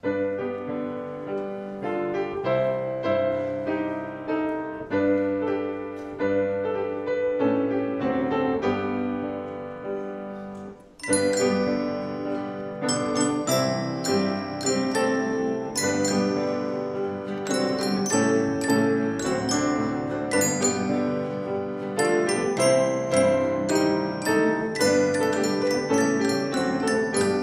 Kinderorchester

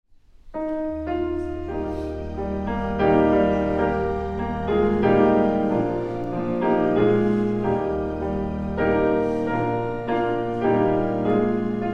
Vorspiel

